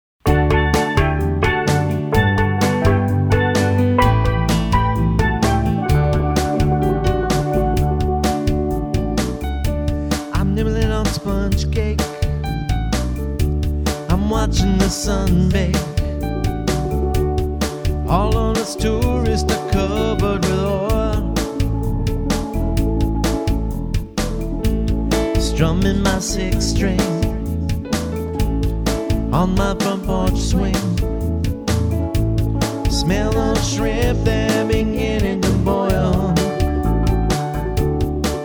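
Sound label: Tropical Vibrations Steel Drum; variety band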